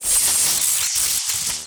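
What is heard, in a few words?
lightning bolts; RYL 2 v1673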